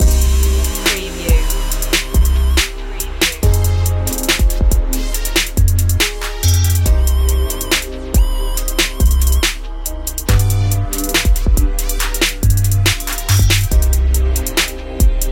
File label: trap beat